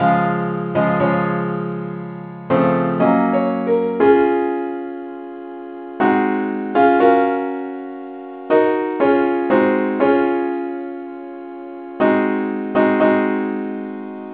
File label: simple acc key cis